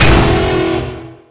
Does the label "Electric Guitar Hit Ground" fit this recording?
Yes